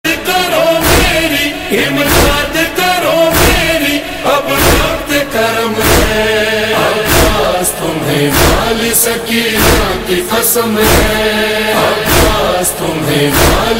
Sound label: noha ringtone